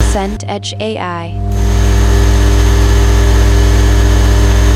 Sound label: oven fan bake